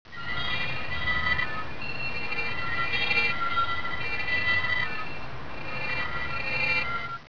squeeks

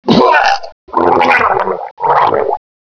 skewer, ambience

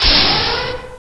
ship door

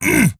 pain; player

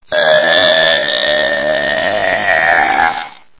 burp; smurf

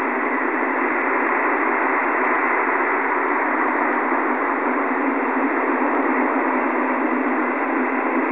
western wind harsh; ambience

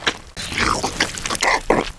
cake eaten

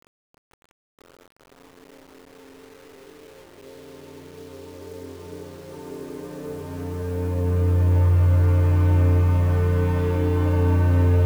death music; mesa facility

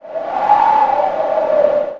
wind gust

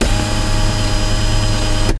door

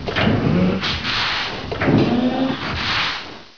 key gate sound

